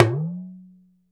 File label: Talking Drum up; Drums&Percussion; E-MU Producer Series Vol. 7 – Old World Instruments